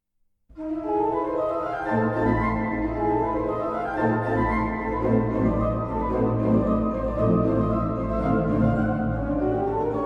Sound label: Allegro vivace; Kantorei und dem JuLifa-Chor